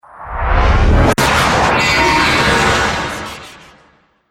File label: Trailer Hit Glitch; Trailer Sound Effects